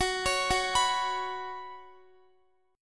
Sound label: Gb Suspended 4th Gb, down, Guitar; Gbsus4 arpeggiated; 4E,4A,5D,5G,5C,6F